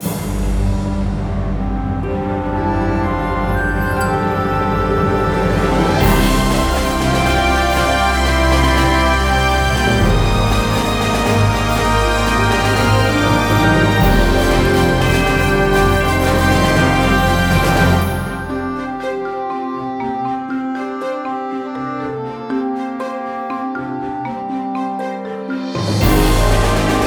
Splash Screen Loop; Scrabble; Scrabble Interactive; Wii

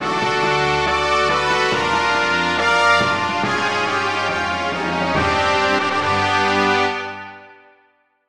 Victory Special; sonic epoch

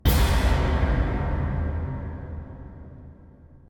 Tymp

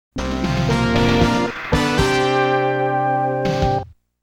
Fanfarre; yu suzuki produce g loc r360 virtua racing; HIRO

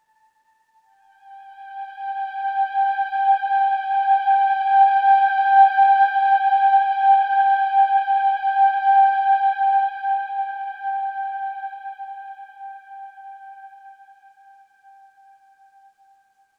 Oneshot Synth

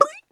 double jump; Scrat

